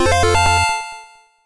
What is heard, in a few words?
floor unlock